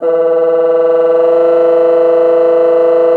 MULTI, bassoon; Xsample VOL-6

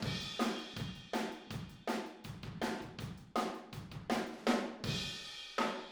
Room ST, Drums, 162 BPM; Take Your Toms Off

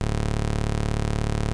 Prophet 5 D2, Synthesizer; Hollywood Edge - Giorgio Moroder Rare Synthesizer Collection

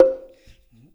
GATO; InVision Interactive

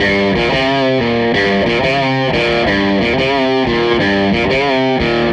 Les Guitar Loop

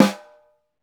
snare, Roland; LA Composer

